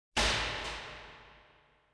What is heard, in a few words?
station; ruina